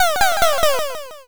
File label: witch laugh